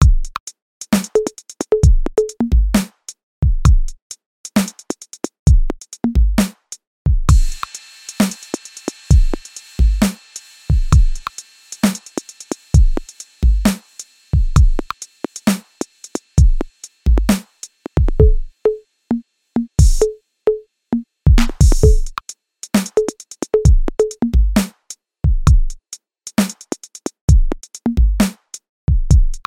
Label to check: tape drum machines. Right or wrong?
Right